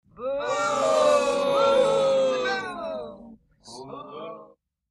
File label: Boos Small Crowd Booing